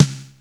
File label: RX5 SNR; Hollywood Edge; The Big Bad Beatmachine